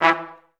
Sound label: TR STAC E2; Best Service; Peter Siedlaczek's Advanced Orchestra